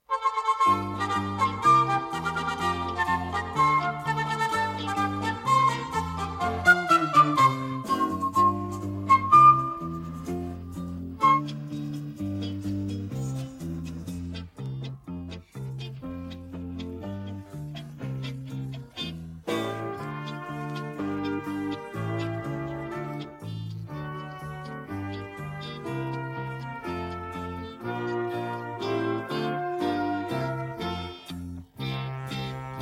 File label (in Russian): detskii khor minus